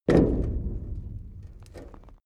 step metal step; schritte

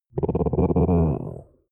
chihuahua growl muffled; natur-tiere; hunde

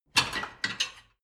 dishwasher plate grab

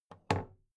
big plastic case handle bounce soft; objekte; kiste

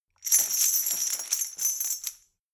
metal chain handle clean

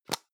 plastic can lid screw open hard; objekte; dose

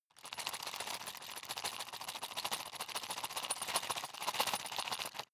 sundries rattle fast; berufe; handwerker; soundpool

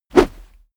whoosh heavy fast; swooshes-swishes

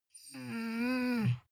dog whine low complain; natur-tiere; hunde

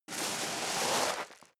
step leather shoes gravel medium slide long; schritte

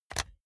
jigsaw button on; soundpool

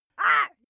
female attack

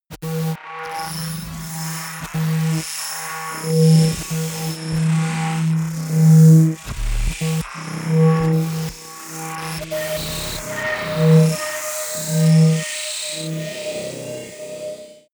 drone sci-fi generator

